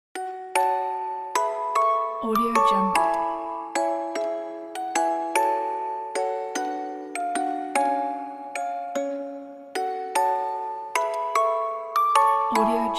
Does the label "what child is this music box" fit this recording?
Yes